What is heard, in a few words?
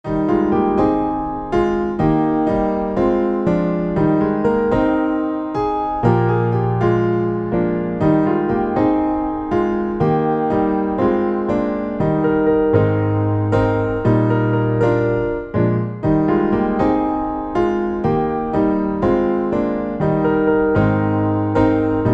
karaoke